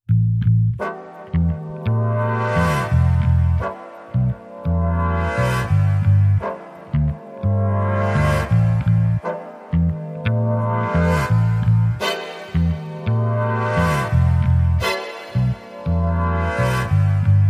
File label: No Rhodes Mix